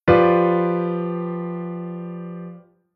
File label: Chord Inversion 3rd inversion